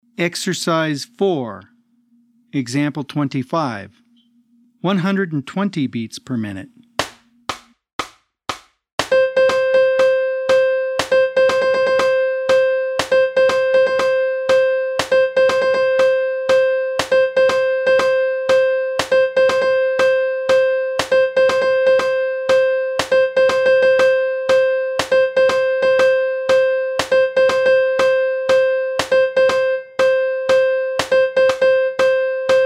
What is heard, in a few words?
120 BPM